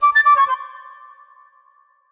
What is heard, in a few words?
Fluting, notifications; Lenovo; ZUI 13